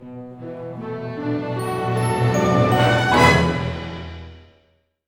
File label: FLY; East West; Scoring Tools; ORC; Flying Star